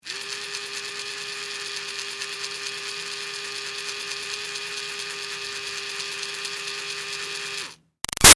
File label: Toy Fairy Wand CU Run Long; Miscellaneous Toy Sound Effects; BigRoomSound